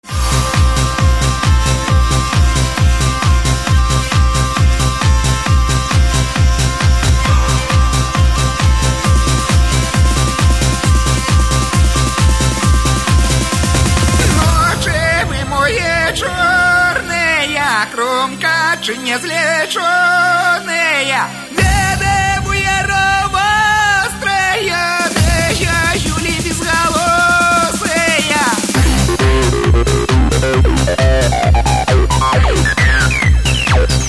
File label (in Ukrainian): skrypka vechornyci